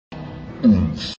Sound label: oomf